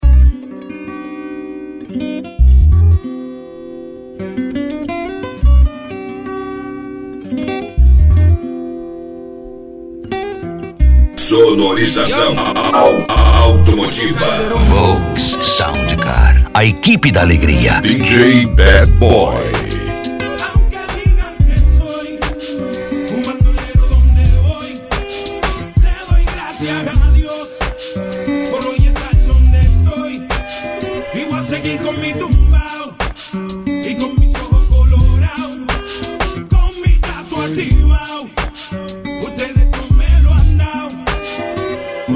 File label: sound car reggaeton; cd volks sound car